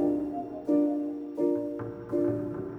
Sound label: Quiet Piano; Solos; BB 2HTC Samples Vol 4 Addendum